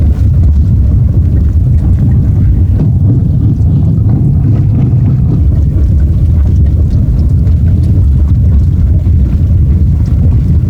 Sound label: rhumble; Kahlo